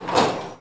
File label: cell Door Close; Phasmophobia